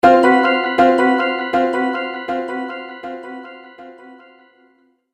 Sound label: Magical Surprise Delay; Magic Sound Effects